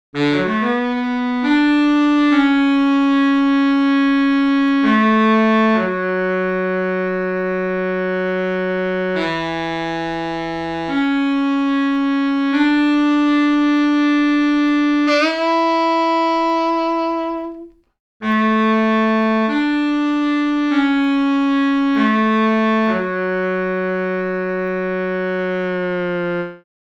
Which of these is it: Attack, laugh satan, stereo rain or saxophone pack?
saxophone pack